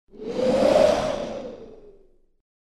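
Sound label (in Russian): порыв ветра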